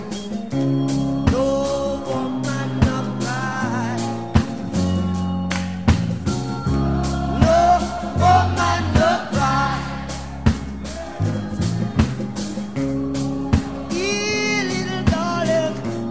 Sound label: ringtone